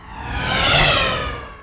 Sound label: explosive simple; Earth and Beyond; EA Games